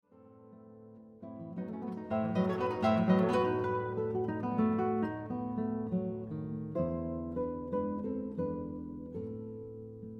Mazurka; Enrique Granados

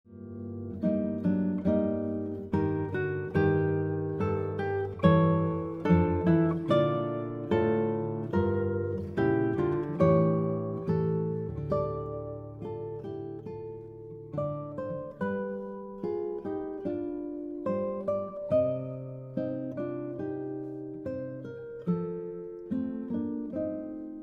Prelude G minor; J.S. Bach; BWV 867

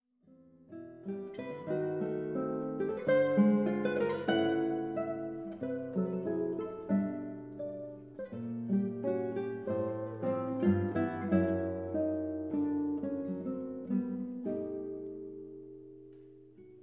Tango Suite Andante rubato malincolico

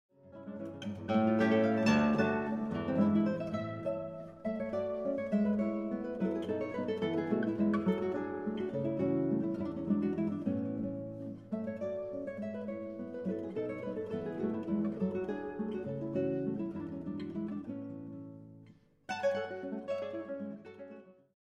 Valses Poeticos Vivo; Valses Poeticos Nr. 7; Enrique Granados

